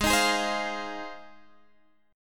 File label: Ab 6th Ab, Guitar, Open G